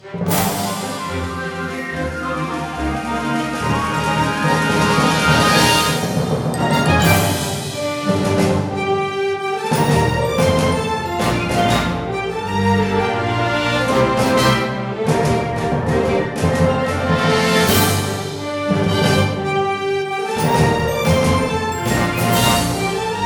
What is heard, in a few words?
Level Stagecoach; Wild West Guns; iOS, Wii